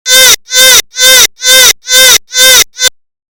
beat

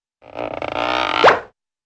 low pitched squeak and pop

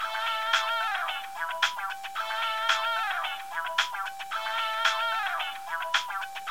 ringtone; funkintime gta iv

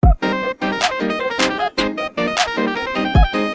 trap remix

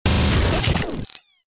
radio jingle

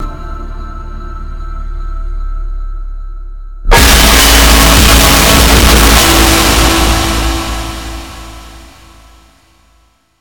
congregation real screamer

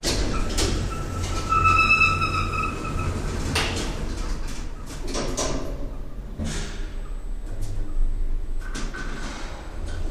old elevator moves

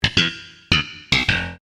sitcom transition bass riff; seinfeld

